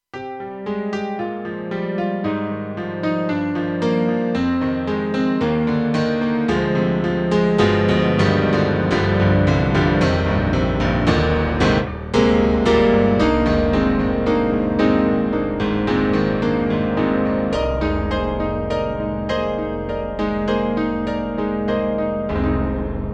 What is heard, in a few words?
Korg TR-Rack Vintage Grand